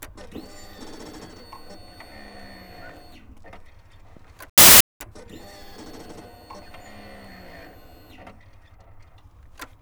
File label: Suzuki GSX foley ignition; MKH8060; Pole Position; Suzuki GSX-R1000